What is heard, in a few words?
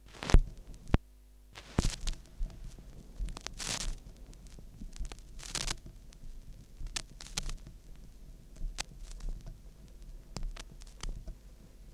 crackles, Vinyl